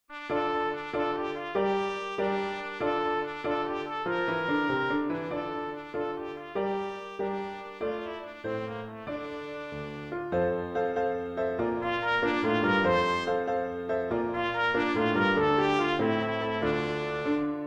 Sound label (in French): fanfare